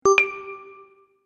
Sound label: Game Select Item, video game